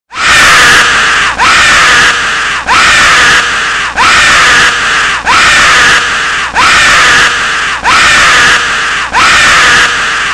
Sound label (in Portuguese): gritos de terror e desespero